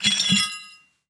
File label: Hole Saw Rattle, Hole Saw Bits